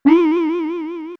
save; SNES; SMRPG

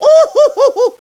ohhhohohoh; DS; ML BOWSERSINSIDE; Mario

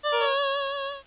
moving through inventory; GAMECUBE; LUIGISMANSION

